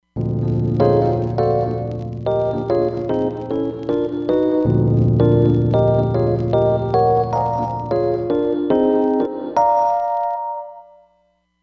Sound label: Hip chord, Roland Fantom XR